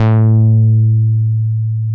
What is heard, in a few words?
MOOG1 BASS A2